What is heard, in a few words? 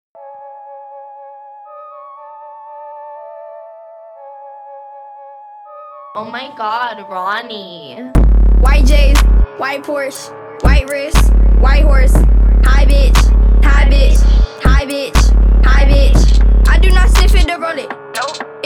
48Hz Low Bass